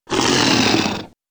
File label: monster